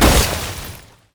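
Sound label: ice impact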